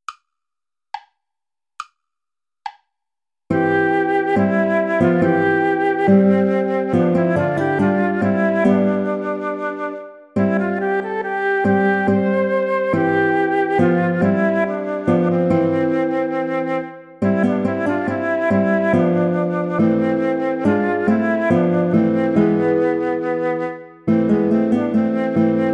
play along without guitar; haendel georg friedrich; tochter zion freue dich

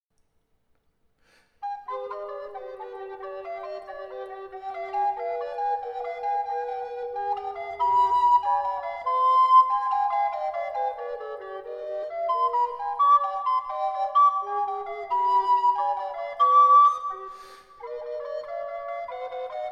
etude deux voix for treble recorder; gall andra

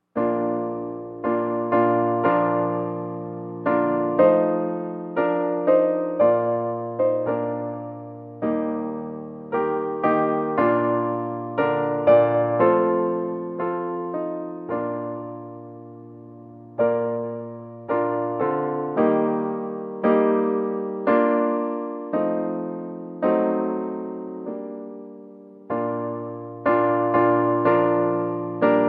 chorale; malan cesar

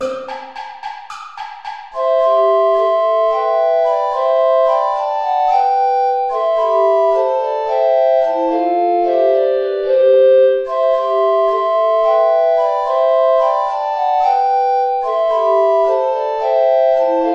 play along without bass recorder

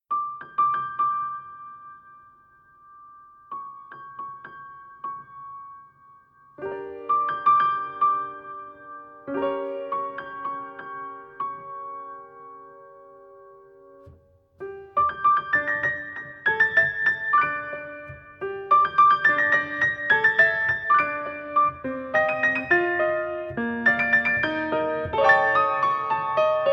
piano four hands

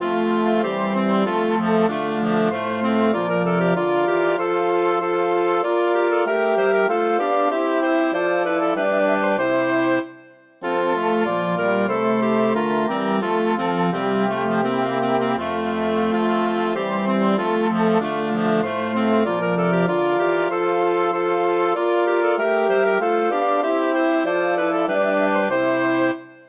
chorale for woodwind ensemble; bach johann sebastian; wie bin ich doch so herzlich froh

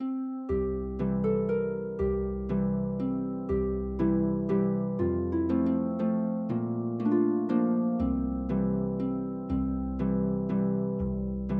for harp

